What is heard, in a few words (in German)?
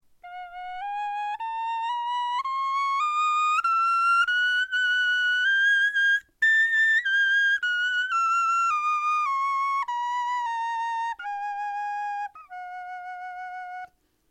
tonleiter, knochenfloeten